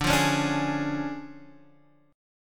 D Minor Major 7th Flat 5th D Guitar Half Step Down